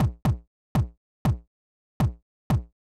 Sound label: TR909 Dst BD; hydrogen